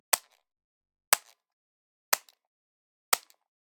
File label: Generic Button Plastic Tiny; boom library; The Interface